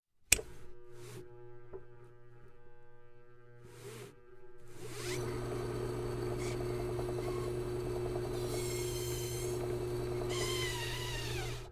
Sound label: drill press